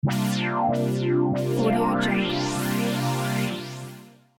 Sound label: retro logo